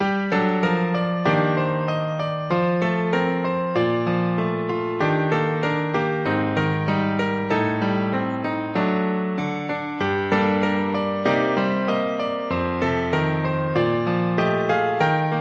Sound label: Piano Etude; Rule of Rose; video game music